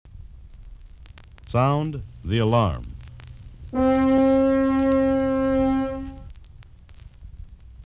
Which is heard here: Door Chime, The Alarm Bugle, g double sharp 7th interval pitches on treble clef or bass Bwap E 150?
The Alarm Bugle